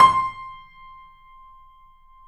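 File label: piano, C, left; InVision Interactive - The Piano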